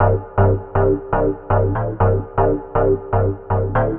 Digi Bass (120BPM), retro house

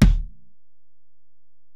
Ac BD, Alesis DM-PRO; 300 Drum Machines

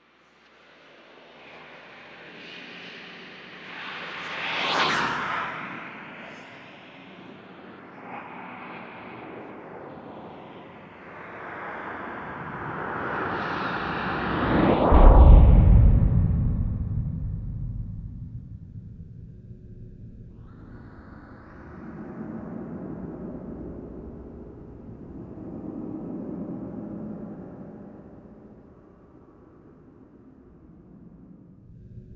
WHISPER; AKAI Anime Sound FX; AMBIENT

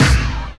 PRC XPUNCH, FM Perc; Rap; Rappin Perc

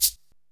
drm C3, DRUMCOMPUTER, EMU SP 12; Best Service; ProSamples vol.55; Retro Sampler; EMU SP 12+1200

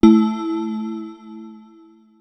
Swirls Vox Bell, pads; X-Static Goldmine

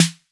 808 snare; SNARES; Wizoo - Platinum 24 Electronic

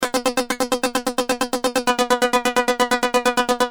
Keys, Industrial

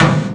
Clank, Industrial; One Shots; Drums; Misc; Sonic Foundry (Sony Creative Software)

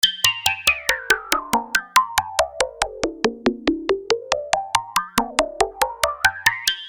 Arpeggio 140 Gm, Techno Synth Loops; Sonic Foundry (Sony Creative Software); Signals I

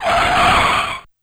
Car Tires Squeal, Yamaha PSR-E323 SFX Kit

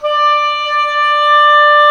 WND OBOE3, Oboe Room; Roland LCDP04 Orchestral Winds; Oboe 4-8

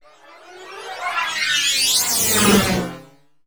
PUSSYFOOT; ILIO; Trance Fusion

